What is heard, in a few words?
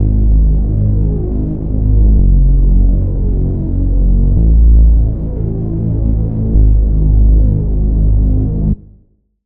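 Reese, Bass; Reese - Racketeering Operation; Sylenth1; Routed Sound; Sylenth1 Expansion - Noble Gangster Vol.1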